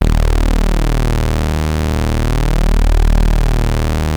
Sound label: OB D#2, Rare Synthesizer; Hollywood Edge - Giorgio Moroder Rare Synthesizer Collection; OB 4 VOX.